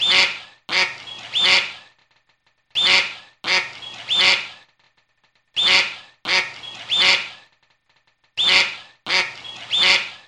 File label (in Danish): Aender rapper; Kroppedal Museum; Alpegården